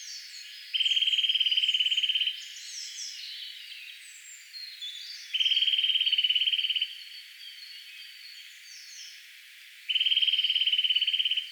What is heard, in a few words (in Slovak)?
Brhlik trrrrrrrrrrrrrr